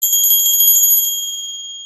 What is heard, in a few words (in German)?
Weihnachtsglocke